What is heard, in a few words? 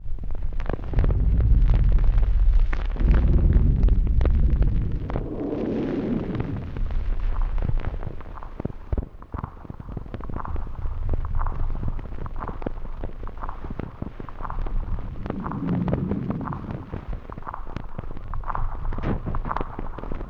Dirty Swamp; Zero-G - Ghost In The Machine